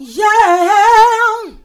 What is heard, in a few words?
YEAHHEHAN, Funky Vocals; USB Soundscan vol.18